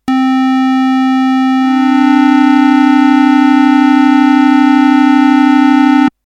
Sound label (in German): grosse sekunde; harmonie